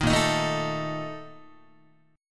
A sharp Major 7th Suspended 4th, Guitar; strummed

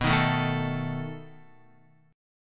B 7th B down Guitar; B7 strummed; JGuitar

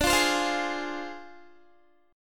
D Minor 7th Flat 5th Guitar; Dm7b5; strummed

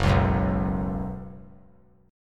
F sharp Suspended 4th; strummed; F#sus4

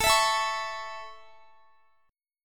A Major A, Guitar; A strummed; x,x,21,21,21,21

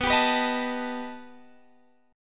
B Augmented 7th B down; B+7; strummed

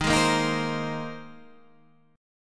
A sharp 7th Suspended 2nd Suspended 4th D sharp

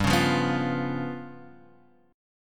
G 7th Flat 5th Guitar Open F, G7b5 chord; strummed

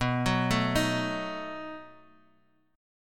B 7th B down Guitar Open D

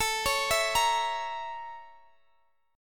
A sharp Minor Major 7th Flat 5th A

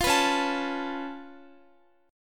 Db Augmented 7th Db down Guitar; Db+7; strummed

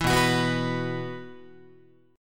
B Suspended 4th, Guitar; Bsus4 strummed; JGuitar

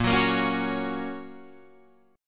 A sharp Minor Sharp 5th; A#m#5 Chord {6 9 8 6 7 9} strummed

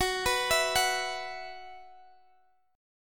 Gb 7th Suspended 4th Gb down Guitar Drop D, chord

